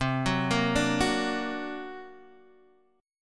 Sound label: D Augmented 7th C, guitar